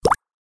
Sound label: notification beep sound